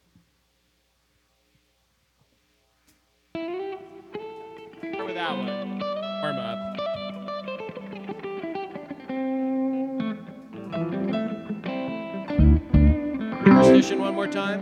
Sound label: Strings Emphasis